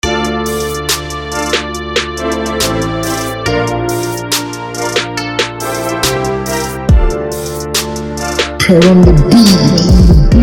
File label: Trap (The Instrumental)